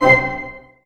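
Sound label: Staccato F#, Orchestra; ORCH STAC.-M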